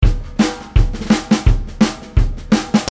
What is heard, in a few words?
Locomotive